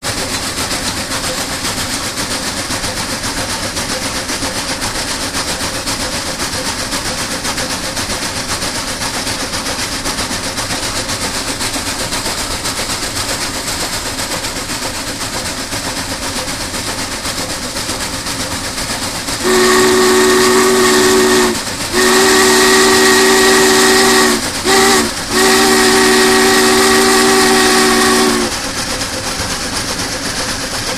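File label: Challenger Medium Fast Whistle, steam train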